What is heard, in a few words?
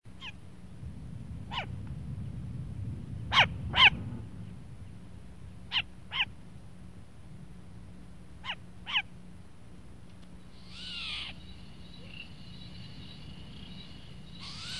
Short eared Owl